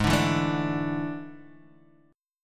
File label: A Major 7th Suspended 4th G sharp